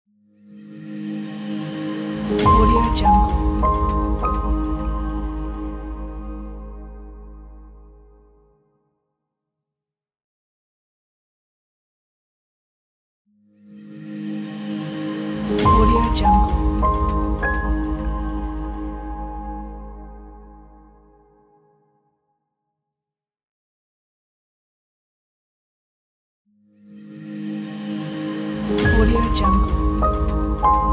elegant minimal logo

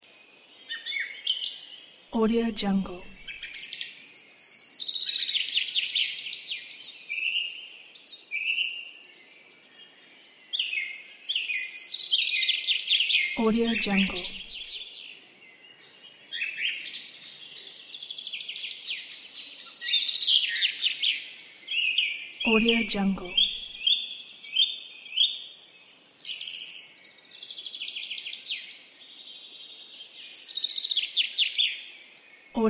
birds nature chirping loop

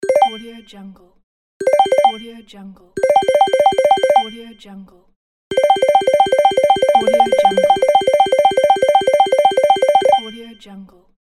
win slot machine

